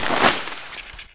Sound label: deploy, weapons